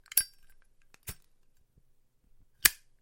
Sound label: lighter